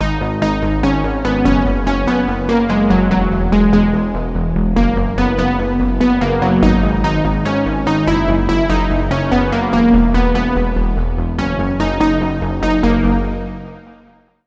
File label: Key, Spire; Future Metropolis; Routed Sound; Spire Expansion; Reality Maker Vol.1